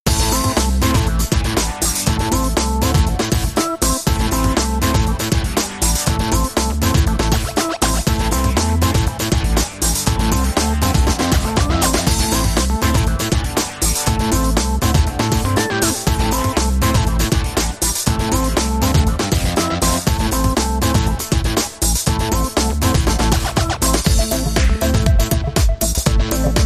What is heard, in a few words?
Phat Happy Talkover